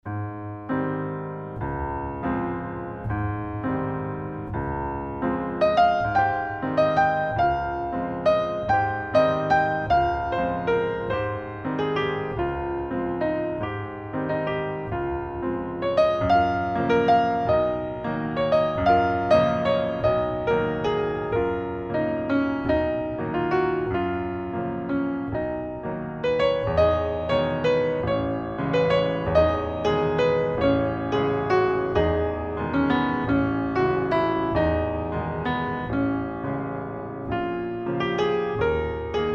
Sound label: ronds de jambe en l'air barre, pianoforte